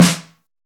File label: warm sounding steel snare drum f key